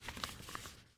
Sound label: Vizor Open; This Is the Police